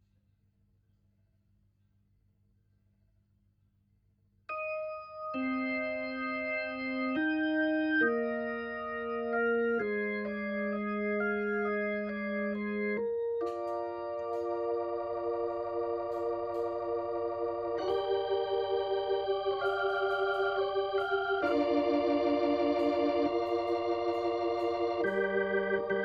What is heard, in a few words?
Hammond Leslie